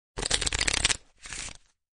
纸牌扑克牌洗牌; 快切音效

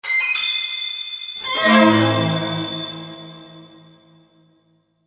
悬疑

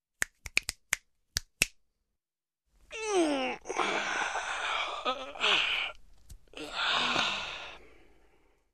打响指 叹息 伸懒腰

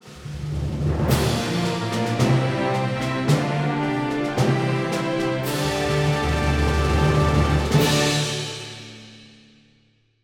SFX, 片头音乐

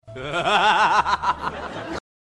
哈哈贱笑音效; 曾小贤恶搞

